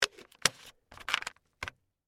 Lid Close Plastic, Foley, Lid, Close, Plastic, Jug, Screw; Lids; Objects; BlastWave